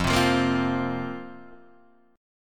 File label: F Suspended 4th F, Guitar Half Step Down; Fsus4